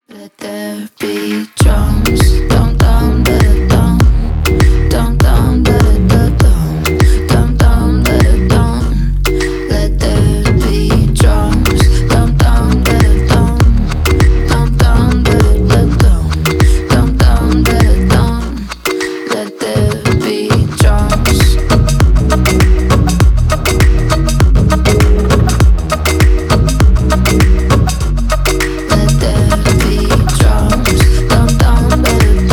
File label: drums